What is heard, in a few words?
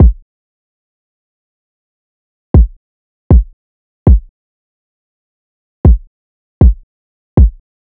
Drum Loop 118 BPM Kick, Hip Hop; Drum Loops; Loop Stems; Cymatics; Nueva Drum Loop